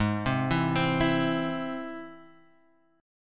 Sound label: G sharp Augmented G sharp Guitar Half Step Down